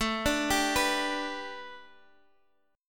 A 7th Suspended 2nd Suspended 4th A Guitar Open G, chord